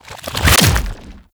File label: Octopede Limb Attach, Enemies; Warframe; ManInTheWall